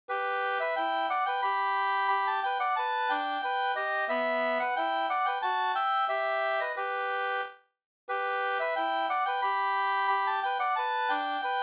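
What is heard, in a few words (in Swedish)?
polska, tvåstämmig; Orsa Da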